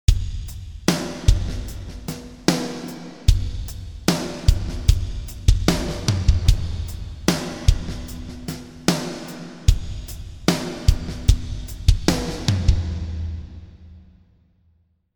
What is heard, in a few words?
Drums Density